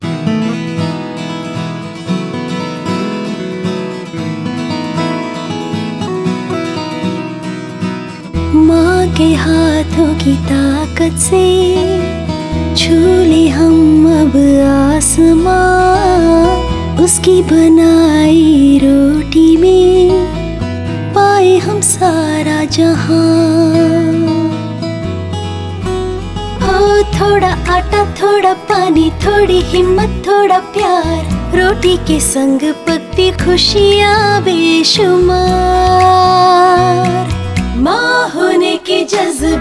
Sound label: tv ad music